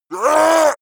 yeti shout short pain

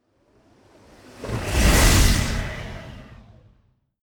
fly by vehicle whistle bright; swooshes-swishes

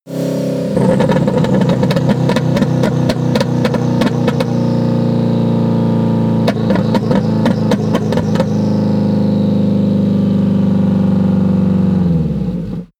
car vw t1 decelerate slowly splutter

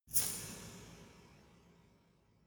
resonator hit single hard

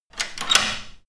locking wooden door

